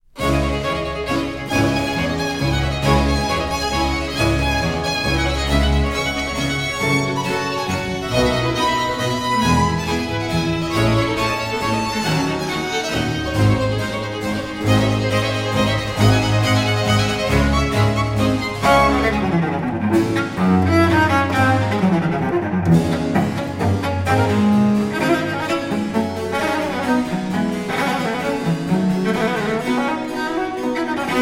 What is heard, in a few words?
concerto for cello in d minor allegro; rv 405 iii